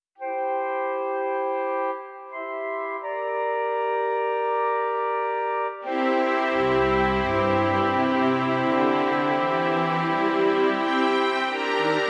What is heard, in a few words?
Heroic legend; Filmtv-tracks